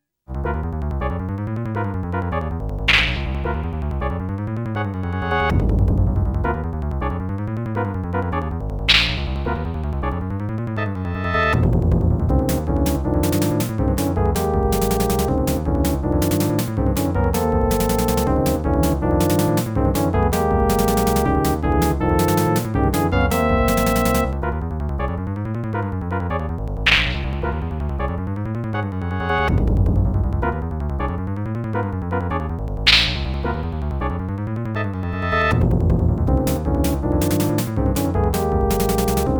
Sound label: Boss Bgm